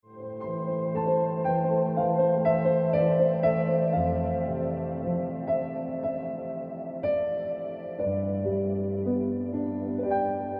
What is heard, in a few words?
Ringtone Piano Music